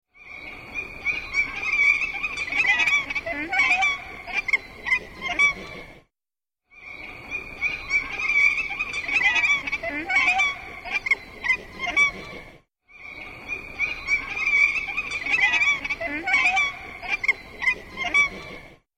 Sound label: Nene; Sounds Hawaiian